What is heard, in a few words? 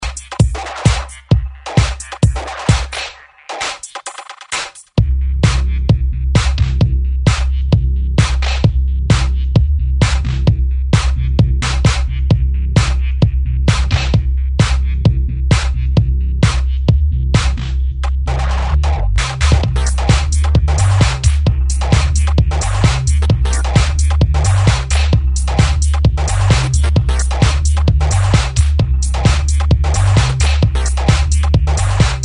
remix